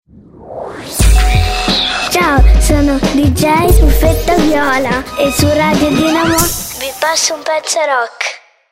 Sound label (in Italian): viola